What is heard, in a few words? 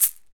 SHEKE, Afro Shakers, Shekeres